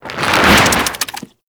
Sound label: Infested Devourer Movement Whoosh A; Warframe